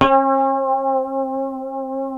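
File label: D 4 harmonic, guitar; Spectrasonics; Hans Zimmer Guitars VOL-1; 90's Sample CDs; HYPEMONICS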